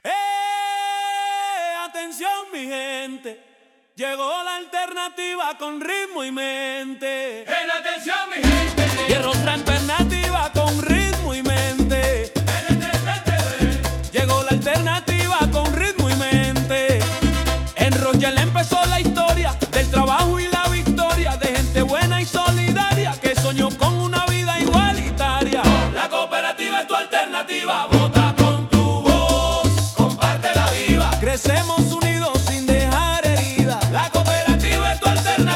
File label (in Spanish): Merengue; La cooperativa